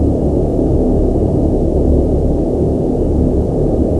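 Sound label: air conditioning; quake2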